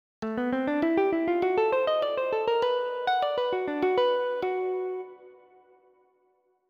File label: Lick